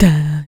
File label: HIT, Jazz FX FEM PERC